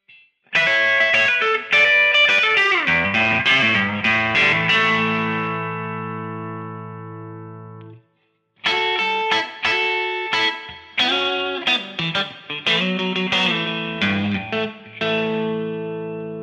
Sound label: Clean